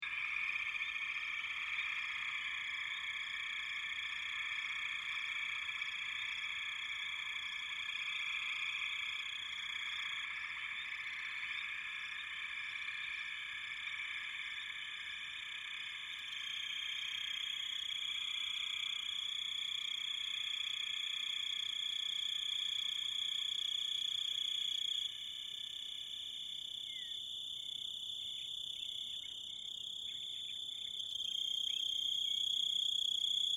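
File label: grasshopper sounds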